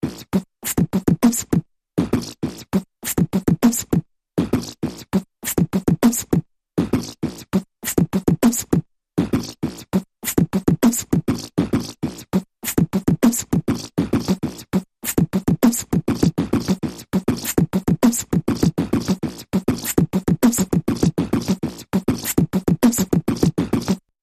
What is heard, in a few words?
Big Mouth Percussion Variation